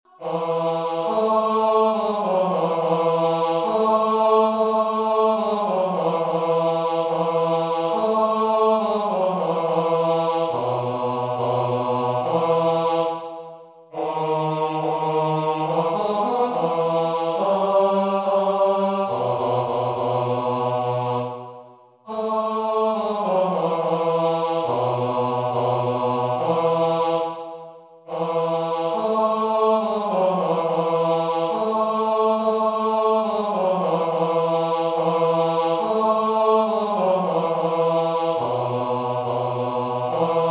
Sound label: Carol Men